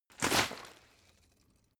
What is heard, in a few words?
bottle water sparse shake single; berufe